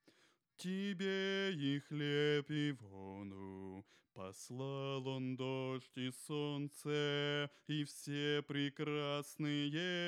bass dyn

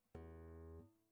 guitar nice

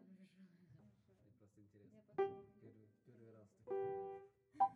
piano

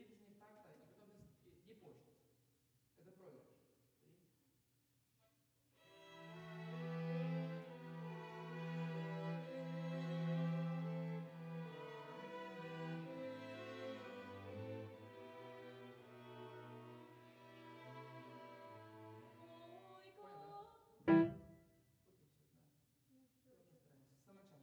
Piano

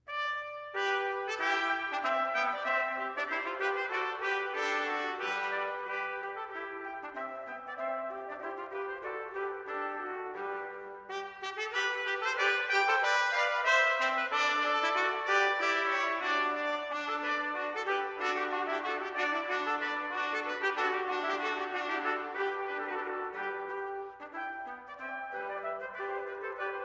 Madrigal Trumpet Ensemble; Wilbye; So Light is Love; New York

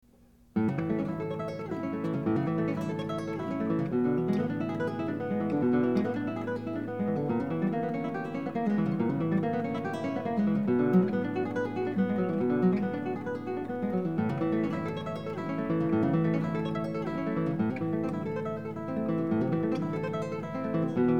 Etude in A Major, Guitar; Villa-Lobos; 300 Years of Guitar Masterpieces

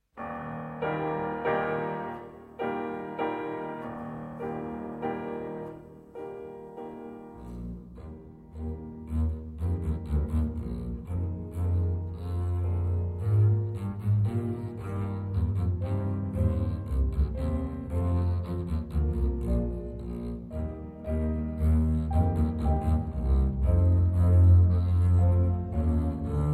Double Bass; Saint-Saens The Elephant from Carnival of the Animals

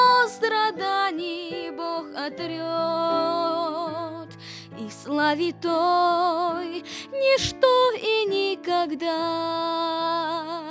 vocal

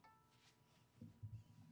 Viola D

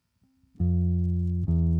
bass

